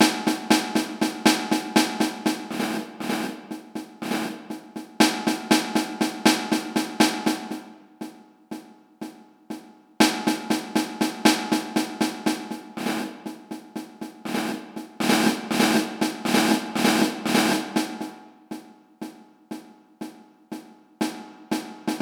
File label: Snare; Band Audition